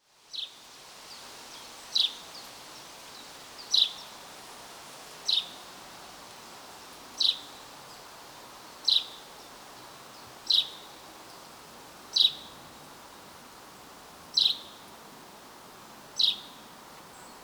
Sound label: Fringilla coelebs; SETE